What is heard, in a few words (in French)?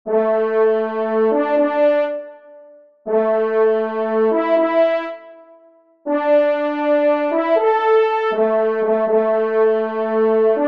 trompe duo; Agnus Dei; messe d'estival